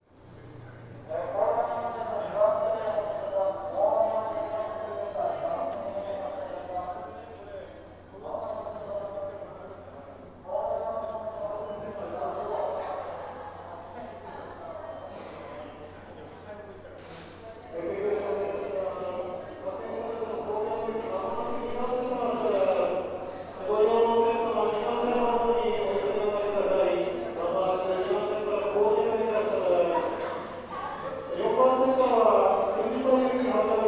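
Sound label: station, Fukushima Soundscape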